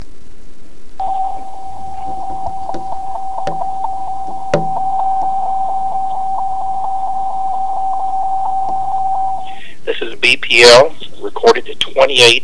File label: BPL 28.022 MHz CW & SSB; Irving TX